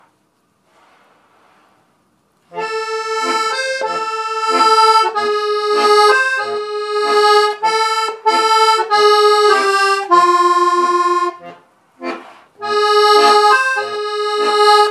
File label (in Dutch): Variatie op blues in F